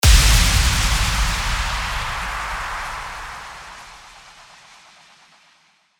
IMPACT

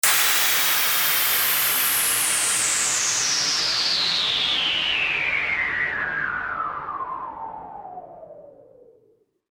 WHOOSH

